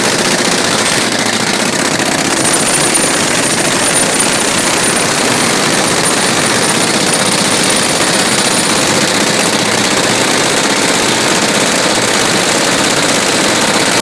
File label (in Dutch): Slibvijzel